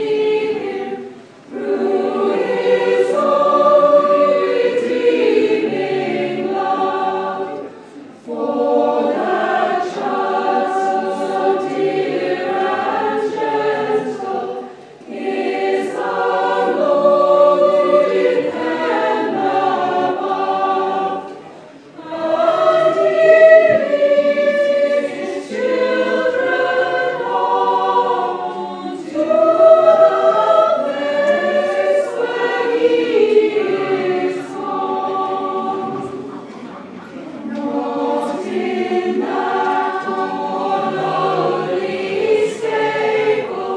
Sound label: singers; pelham house lewes